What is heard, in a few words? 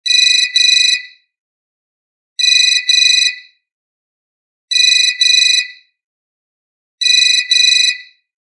early 2000s ringtone